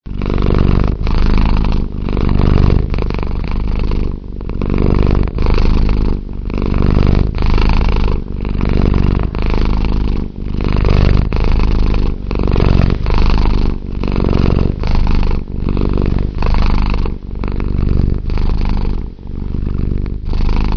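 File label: Loud purr, cat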